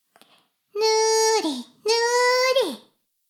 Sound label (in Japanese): シコシコ音声; 古き城のドラゴニート2